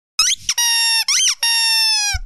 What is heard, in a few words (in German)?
quieck